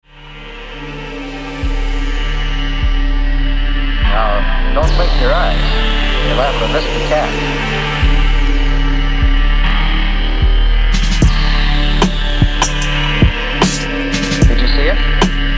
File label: horror; horror worx